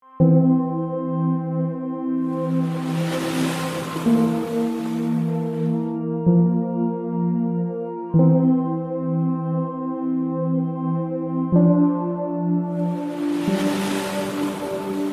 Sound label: relaxing disclaimer music